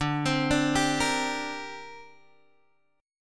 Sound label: D 7th Suspended 4th Sharp 5th, down, Guitar, Open G